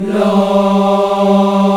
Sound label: VOX, African Aahs, African Las; Roland LCDP11 Africa VOL-1